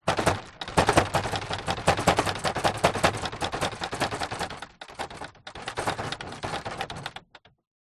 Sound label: industrial plastic metal heavy machine or tall unit shake wobble